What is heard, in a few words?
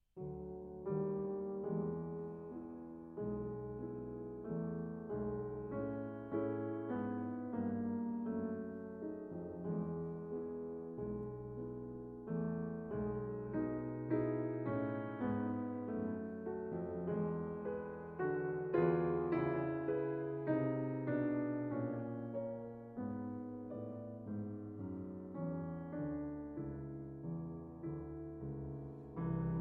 Piano Sonata in B-Flat Major Andante caloroso